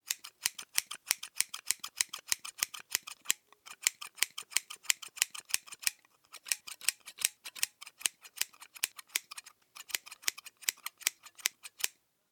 cutting hair at barbershop

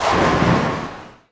knocking down bowling pins

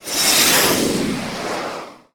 impact of words appearing

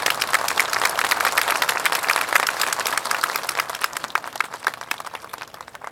clapping hands

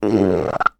stomach upset

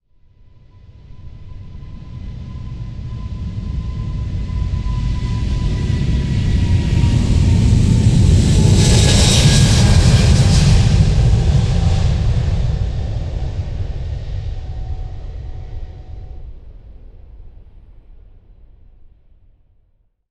passenger plane taking off from runway

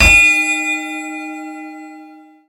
pre match bell, anime

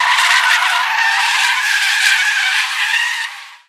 car braking suddenly

